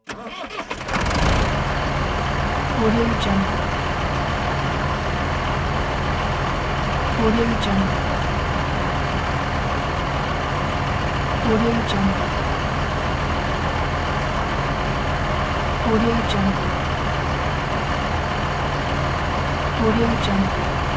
bulldozer engine

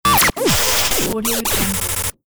abstract glitch; alenalt

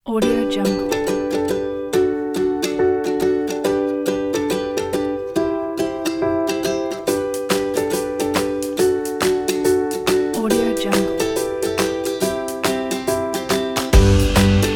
acoustic upbeat

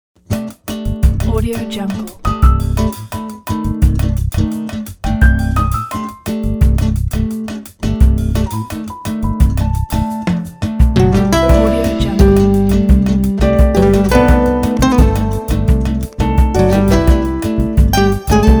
bossa samba travel

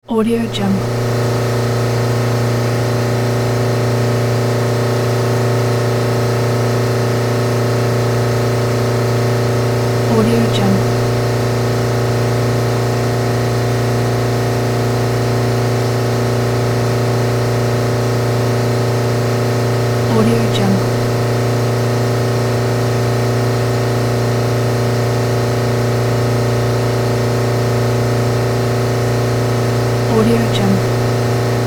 passenger cruise ship high pressure water pump; sound ideas

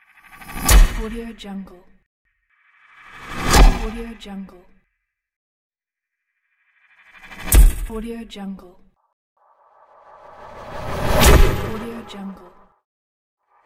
metal hit; postsoundmt

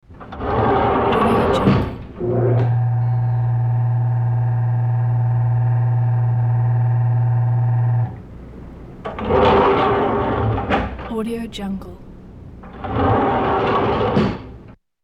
elevator on run open close; hollywoodedge

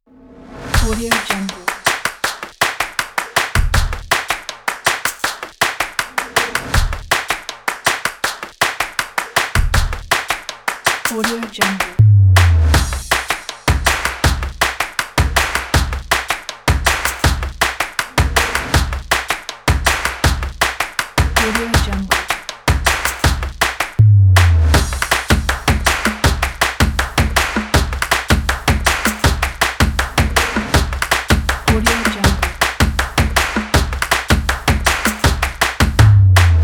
percussion clap

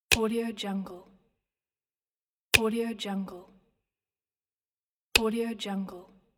finger snap

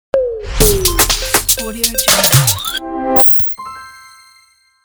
corporate glitchy ident; music2noise

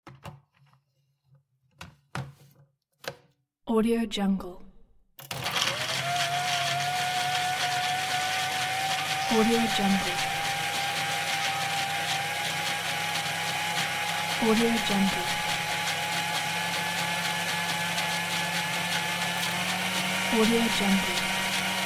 household blender on off

